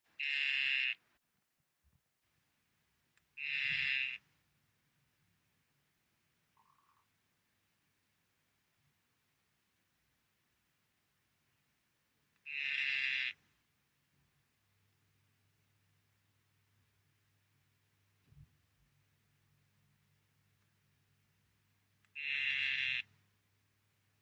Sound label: tinctorius azureus calling